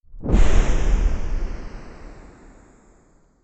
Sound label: Drop Ship Landing; Harvest Massive Encounter